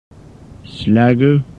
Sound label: slap